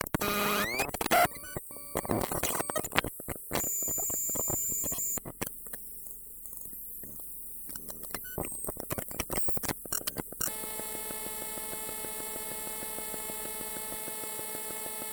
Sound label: Thinkpad R40e boot loop; Valentina Vuksic